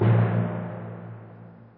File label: Tymp